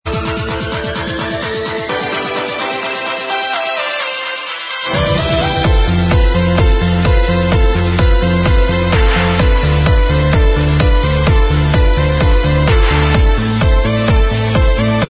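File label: instrumental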